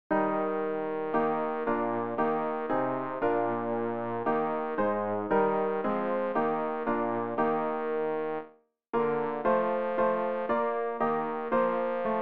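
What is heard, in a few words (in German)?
bass, Übehilfen; Ref. Gesangbuch; RG 178: Nun schreib ins Buch des Lebens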